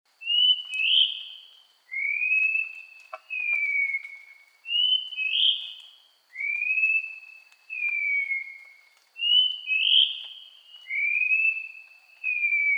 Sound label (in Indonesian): suara cingcoang sulawesi